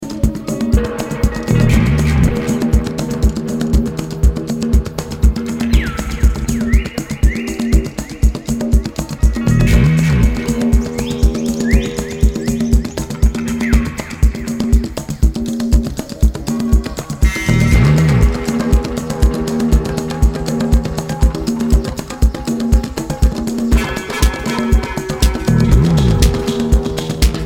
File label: Forest Flanger sampler